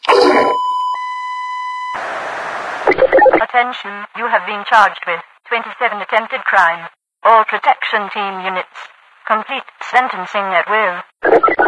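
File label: die, alien controller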